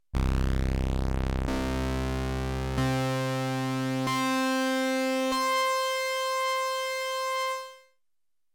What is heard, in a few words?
wave saw, Kawai sx240